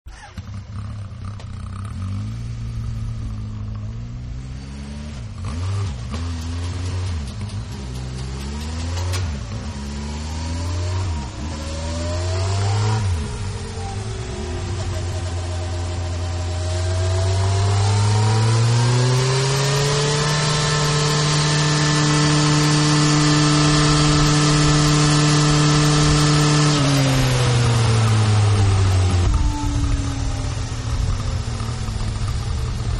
Ducati Start and Goes, Motorcycle; Motorcycles; Vehicles

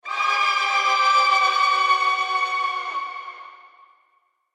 Screams Ghosts; Ghost Sound Effects; horror sound effects

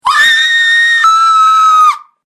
Scream Human Female; Screaming Sound Effects

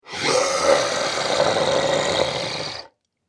Scream Demon; Monster Sound Effects; horror

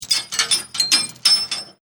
Chain Metal Clanging

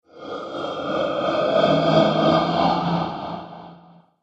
Ghostly Halloween Monster

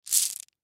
Marbles Bag Hand, rustling; Foley; Blastwave

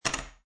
Poker Chips Drop One Chip On Wood Table; Gambling Sound Effects; Lynne Music

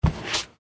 Laundry Detergent, Detergent Pick Up; Household; Blastwave

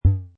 Muted, Button sound or menu sound; Multimedia

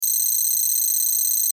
DIGITAL ACTIVITY; Digital (Sound Effects:Sound Design)

